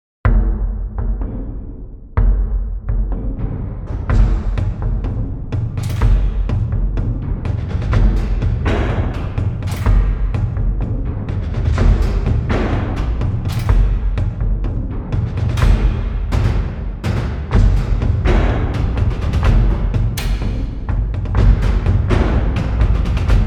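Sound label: strings, grooving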